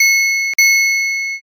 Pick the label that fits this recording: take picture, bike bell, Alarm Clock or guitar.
bike bell